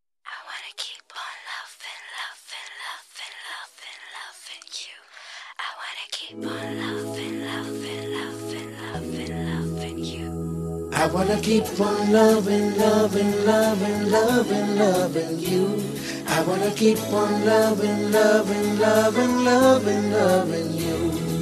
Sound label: Best Romantic Ringtone